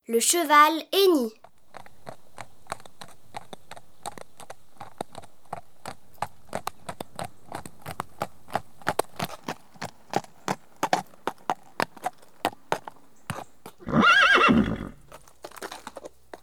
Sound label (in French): Le cheval; Mon imagier sonore de la nature